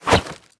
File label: melee attack knife; viper